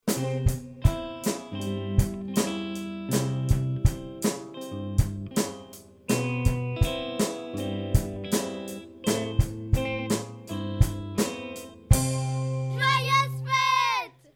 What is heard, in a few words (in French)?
jingle